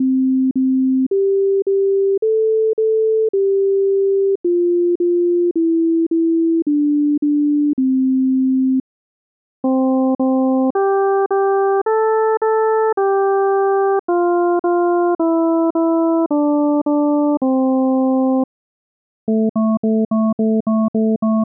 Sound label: melody study, wavetable synthesis